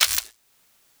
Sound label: Weapon Foley (Laser)